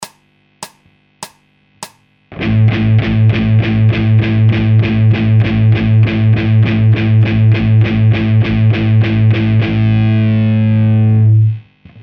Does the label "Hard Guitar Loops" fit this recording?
Yes